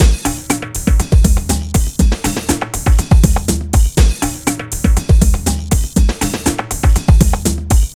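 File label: drum loops, left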